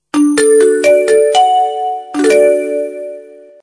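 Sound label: chimes, school bell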